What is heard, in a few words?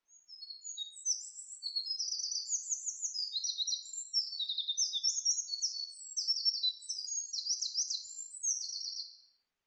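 misosazai song, Eurasian wren; saezuri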